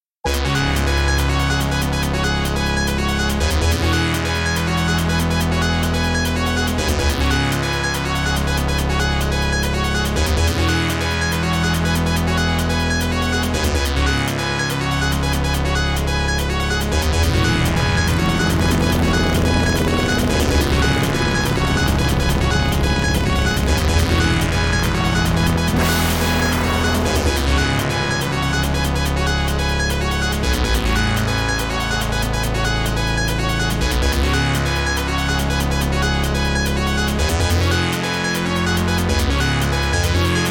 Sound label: Warp Out; Star Cruiser; PC-8801